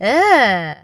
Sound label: disgust